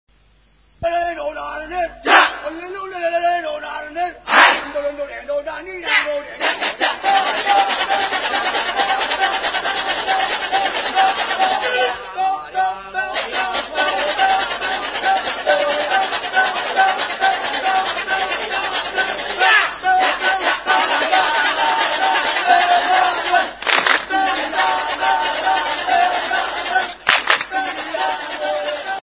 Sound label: kecak